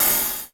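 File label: OPEN HI HAT; 90 sSampleCDs; Crypton - DrumBase5000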